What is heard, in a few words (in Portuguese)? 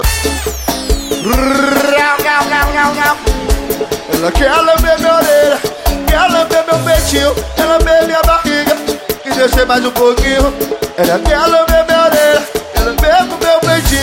revira esse ao vivo; homem aranha